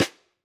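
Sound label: Pearl Piccolo Side Snare On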